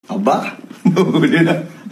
laughs; coco martin

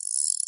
fishing rod

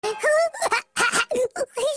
laugh

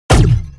plasma cutter shot

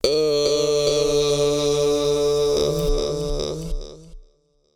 ughhh

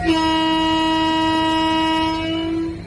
high horn

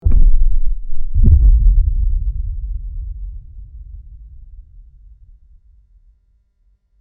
colossal footstep

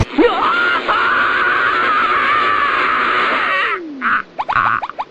getting electrocuted

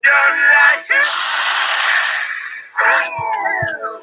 yodel explosion

